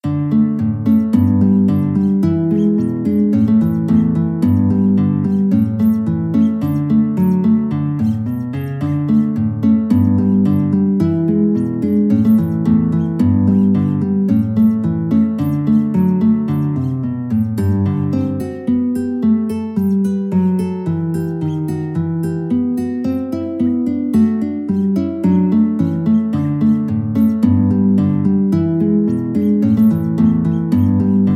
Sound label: GUIT; guitar